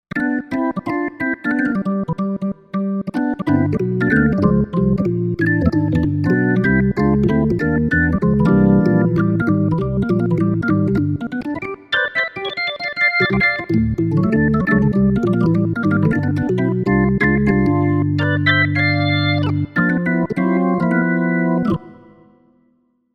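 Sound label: Organ Perc; Jimmy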